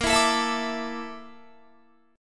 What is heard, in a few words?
Bb Major 7th Flat 5th, Guitar; strummed